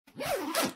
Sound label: open backpack